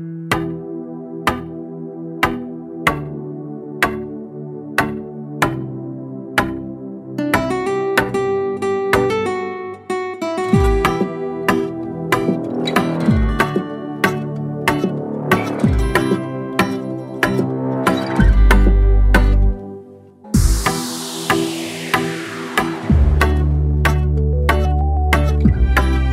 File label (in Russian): metronome ritmichnaya instrumentalnaya muzyka; metronome xminus